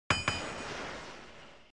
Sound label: sound blacksmith; ageofcv